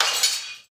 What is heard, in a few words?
Pot Break; SoundsCrate